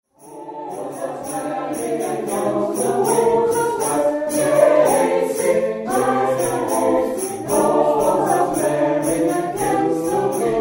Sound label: SATB; Lupset Chase